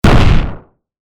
爆発 小 モノラル; 武器効果音

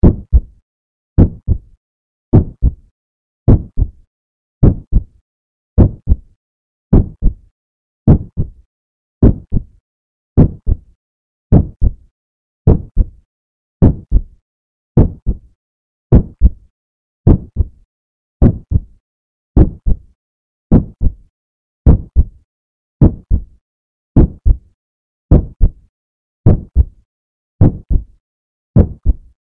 鼓動

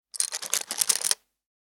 点棒を取り出す; 麻雀; ボードゲームやカジノ

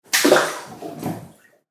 風呂に入る; 日常生活の効果音

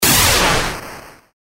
雷魔法 中 モノラル; 魔法効果音

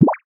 ぴょこ

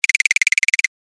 ガタガタ震える

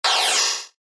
扉を開ける 近未来

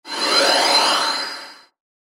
タメ

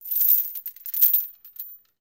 鎖の音; dungeon

